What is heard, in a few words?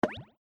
stacking